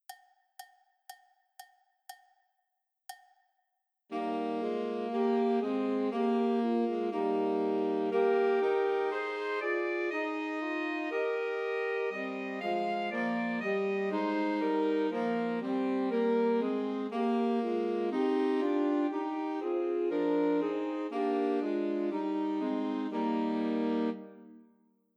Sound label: Trios for Two Altos and Tenor Saxophone; Christmas; Unto Us a Boy is Born